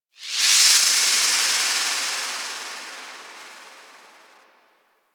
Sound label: Energy Thread Curve One Off; Warframe; Apostasy